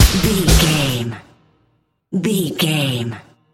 climb higher sting, Dance, EDM, Club, Eurodance, Energetic; Exercise; Aerobic; Aerobics; Spinning; Workout